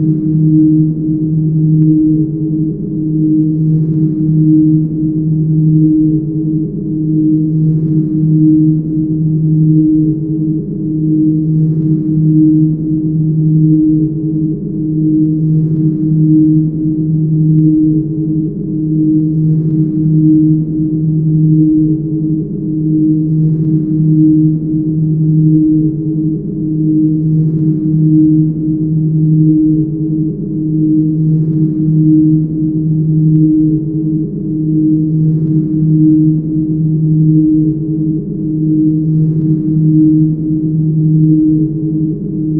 Ambiance

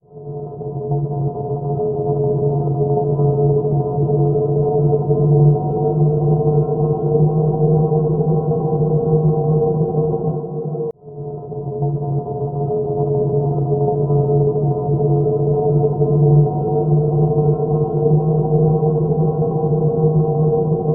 drone

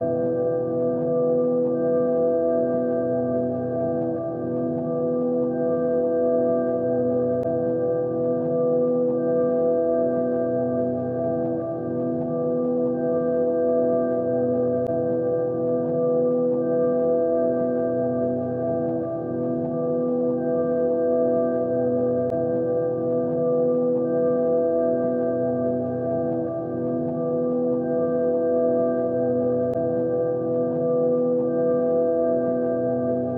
BGM

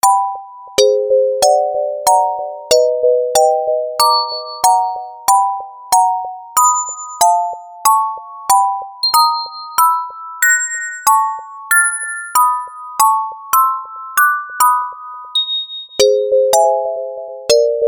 dark

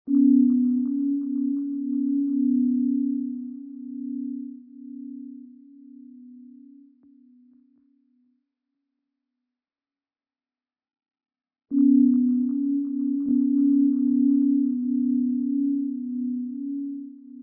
Music slow

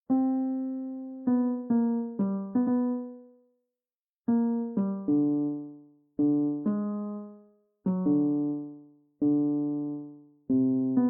song

